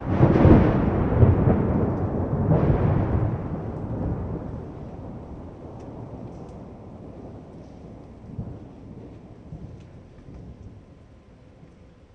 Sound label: Thunder Crack Rain